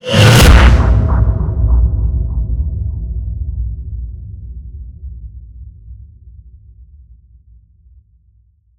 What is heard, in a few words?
impact hollow; Virtual Riot; Impacts